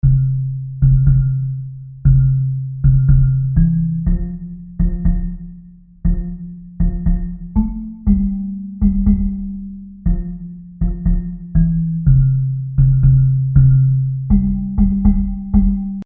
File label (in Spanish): Bajo